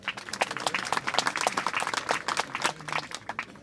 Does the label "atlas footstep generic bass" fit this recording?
No